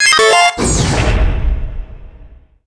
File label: hatch open